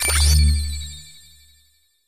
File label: space chip minor